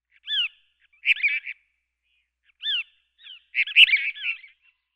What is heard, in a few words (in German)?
Texaskoenigstyrann Tyrannus couchii, Vogelstimmen